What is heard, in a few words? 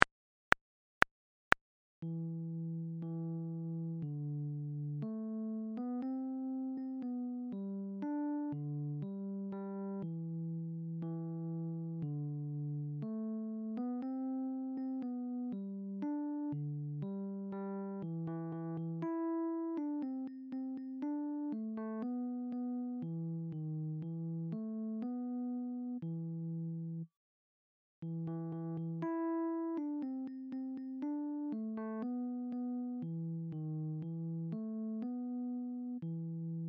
Baritone; Sweet Kate